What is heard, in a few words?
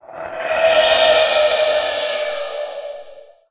Uplifter